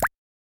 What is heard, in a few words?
Water Drop Notification Day; Xiaomi Redmi-Note-8-Pro